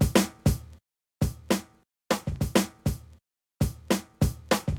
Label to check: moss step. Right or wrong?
Wrong